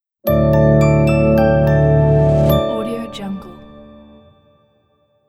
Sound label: bright simple logo